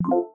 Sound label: camera on